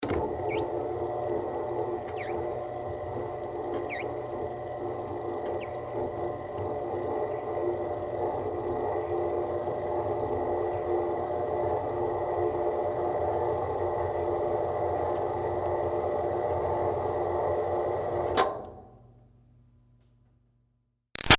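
Projector Screen Close Up Down Long Steady Squeaky; Offices; Big Room Sound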